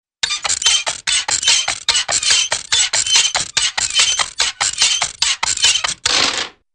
Fast Rhythmic Metal Squeaks & Light Click-like Thunks; Hollywood Edge Cartoon Trax